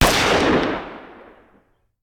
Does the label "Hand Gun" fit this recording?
Yes